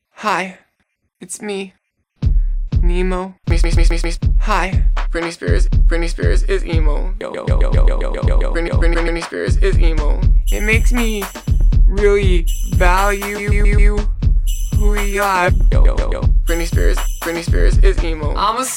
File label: beatbox